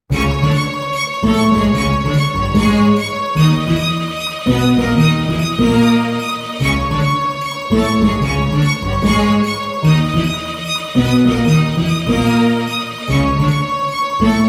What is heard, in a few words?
Violin; Undertale